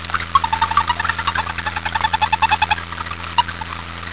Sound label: toad, pond; Western Toad; frog pond